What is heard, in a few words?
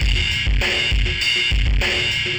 ind dist ride